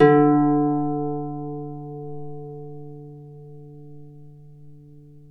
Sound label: Celtic Harp, Plucked Strings